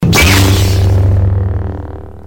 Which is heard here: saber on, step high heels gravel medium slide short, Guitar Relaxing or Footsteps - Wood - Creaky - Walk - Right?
saber on